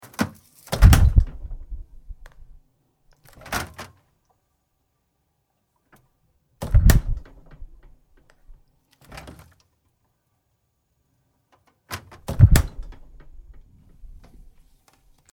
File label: refrigerator